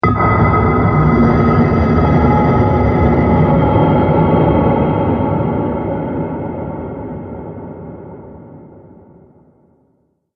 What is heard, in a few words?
Epic Scifi Horror, scary tone